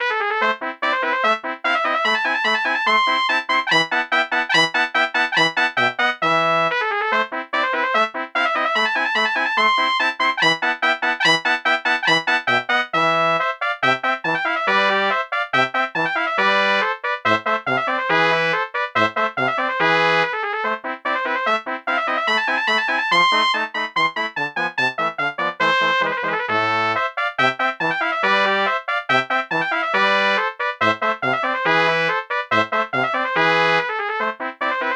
trumpet

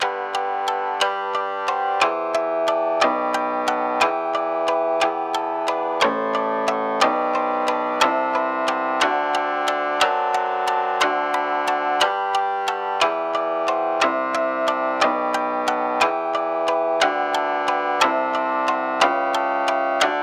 shamisen

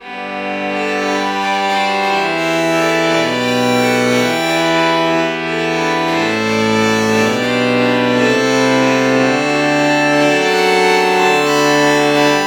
violin violin lpc true bach morph

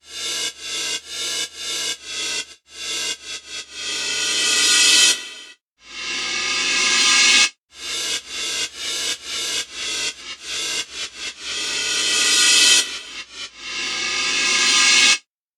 reverse cymbal

